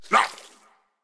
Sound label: hitreact small